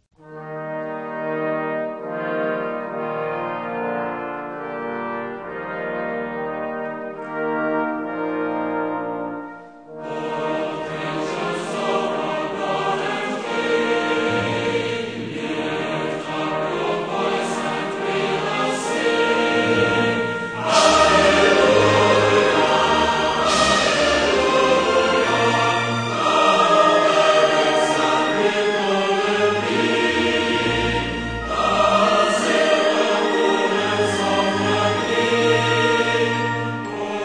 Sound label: Orchestra and Choir, Hymns